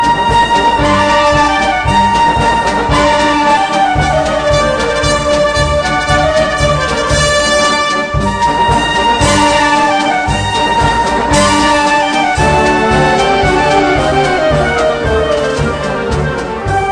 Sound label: gujarati tone; country